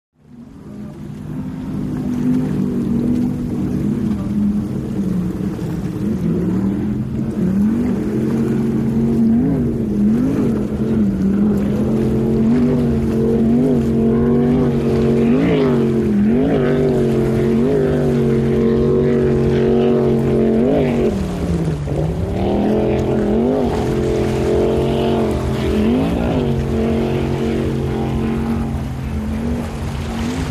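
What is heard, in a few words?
Power Boat Race Ambience, off shore power boat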